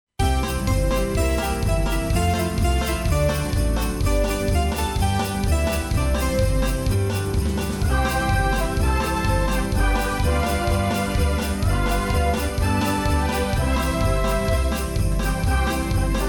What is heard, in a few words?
with bells, square dance music